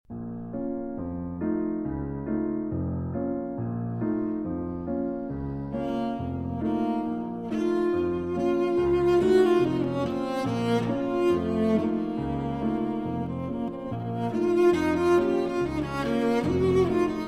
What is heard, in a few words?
Chanson russe (Arr. For Cello and Piano); Stravinsky